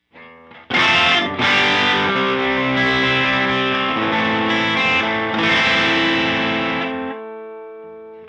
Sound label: JC Extra Deep Elec Guitar; StageOne